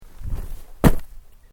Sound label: Footsteps Boots Gravel Fine Jump; Footstep Foley; Footsteps (Sound Effects:Human)